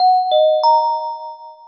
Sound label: ding dong ding